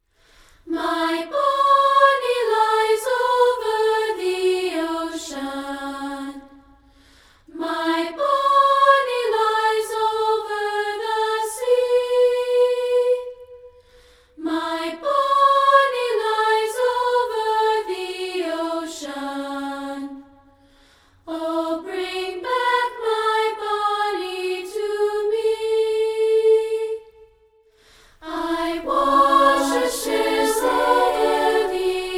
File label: A Cappella; My Bonnie Lies Over The Ocean